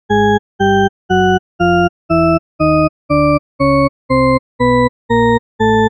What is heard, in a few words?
Shepard Tone D